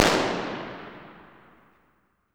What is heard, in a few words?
Gunshot